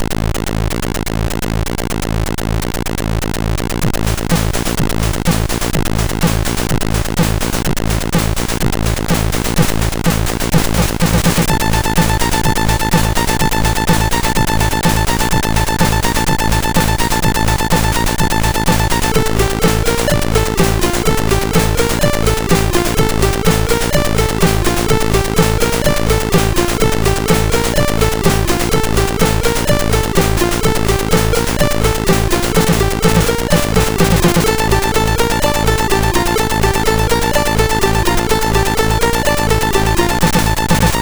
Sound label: Techno sid MOS6581R4